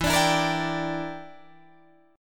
E Minor 7th Flat 5th E, Guitar Drop D

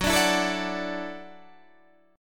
Gb Minor 7th Sharp 5th, Guitar Drop D

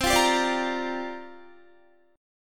C 7th Suspended 2nd Suspended 4th, Guitar; C7sus2sus4 strummed

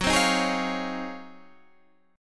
G sharp 13th F sharp

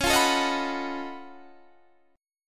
F sharp Minor Major 13th C sharp

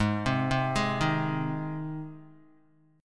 Ab Major Ab down Guitar; arpeggiated; JGuitar

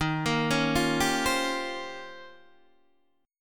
D sharp 13th, Guitar, DADGAD

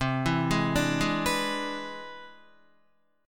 C Augmented Major 9th C down Guitar; arpeggiated; 4F,4A,5C,5G,5C,6E; chord; JGuitar

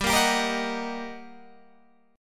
G Minor Major 7th Sharp 5th G, Guitar Open G; chordshape; down

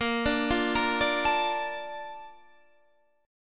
Bb Major 7th, Guitar, Half Step Down

